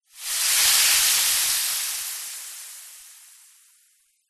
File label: horror acid burn; zapsplat